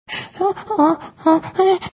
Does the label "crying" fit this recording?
Yes